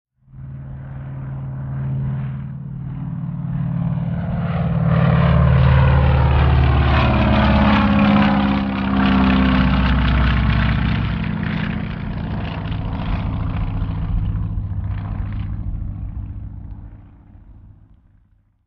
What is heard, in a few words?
Prop Twin By Away, ww2 fighters